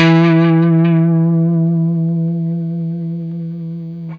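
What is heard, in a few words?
blues long vib, Guitar; 90 s Sample CDs; Quantum Leap - Guitar & Bass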